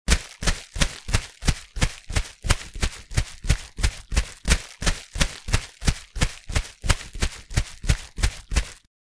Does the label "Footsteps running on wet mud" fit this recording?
Yes